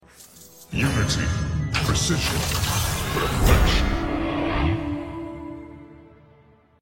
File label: summoning sound; hearthstone; zilliax